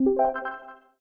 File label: level Complete